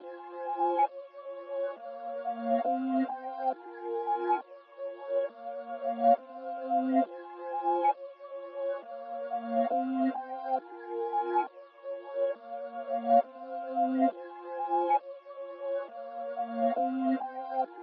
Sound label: 136 BPM F Min Reverse Chords; Melody; Loop Stems; Cymatics; 2022 Melody Collection; Cameras